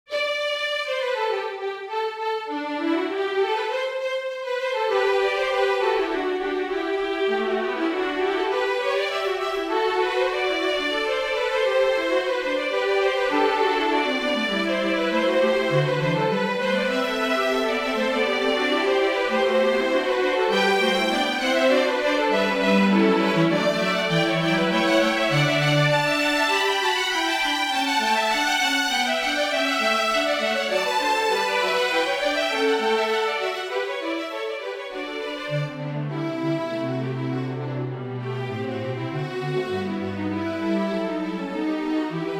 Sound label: Handel Unfinished Fugue, strings